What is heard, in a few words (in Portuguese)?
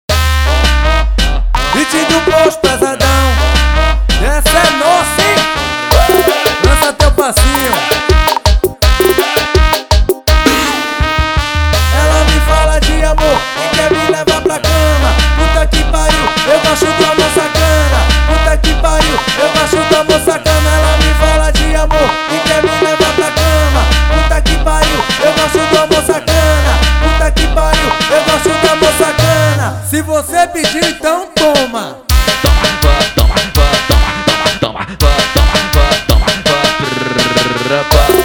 arrocha